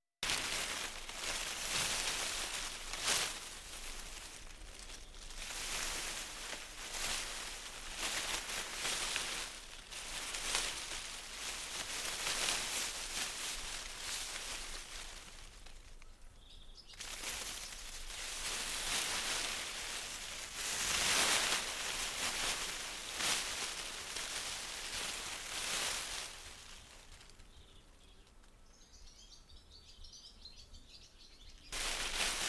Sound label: Foliage Feuillage